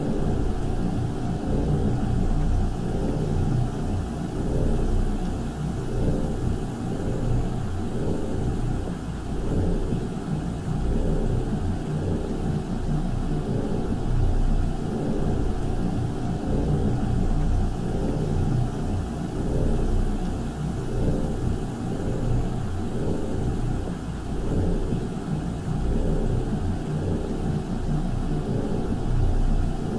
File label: ship transport ship idle; Stellaris; machineage